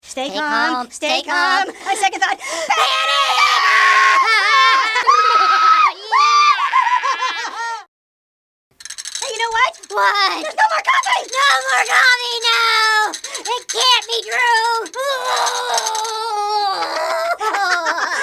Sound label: panic Sound Button